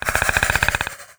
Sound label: warning, antlion; creatures; vox